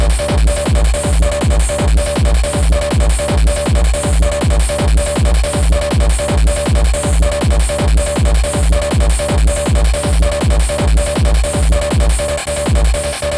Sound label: 8bit Mayhem